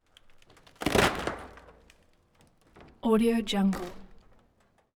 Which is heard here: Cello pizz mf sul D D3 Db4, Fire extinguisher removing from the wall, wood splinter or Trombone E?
wood splinter